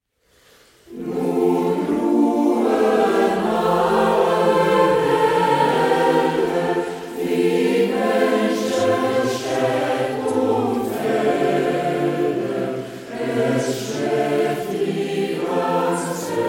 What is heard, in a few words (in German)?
Chor; BACH Nun ruhen alle Wälder; Chor Gymnasium Neufeld